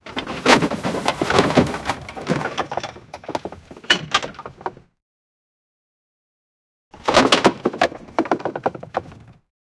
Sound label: wooden cart crashing crea